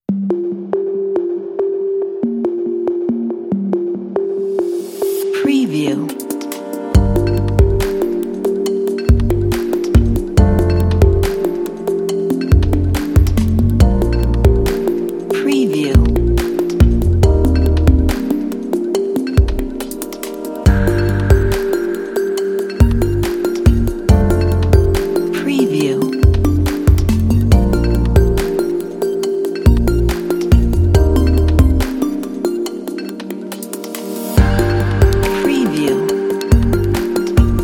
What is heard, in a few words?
soft ambient lounge background music